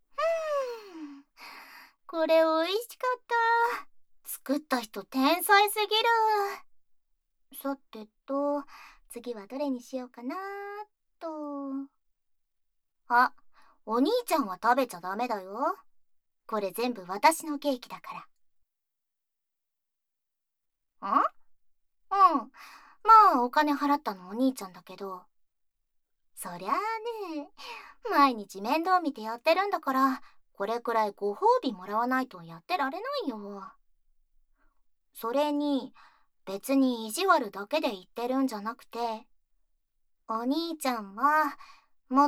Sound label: Off SE, ASMR